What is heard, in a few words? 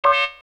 Tech, Weird Side Samples